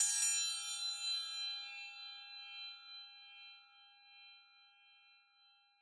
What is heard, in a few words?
triangle; contest start